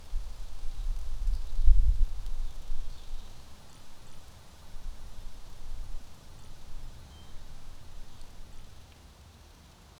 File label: realistic outdoor garden, field recording